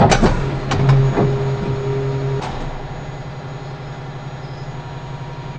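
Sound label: ambience industrial start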